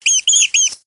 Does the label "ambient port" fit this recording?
Yes